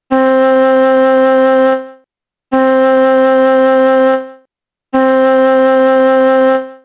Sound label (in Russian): 2 oktava 1 do flejta